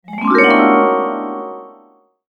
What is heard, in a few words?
Secret Found; Serious Sam 2